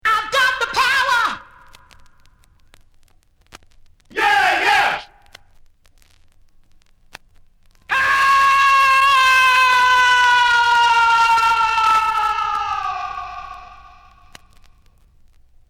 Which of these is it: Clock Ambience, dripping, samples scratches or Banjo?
samples scratches